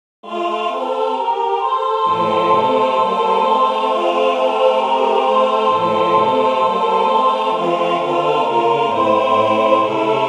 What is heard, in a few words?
satb, church choir; when the saints come marching in; Original Compositions for Church Choirs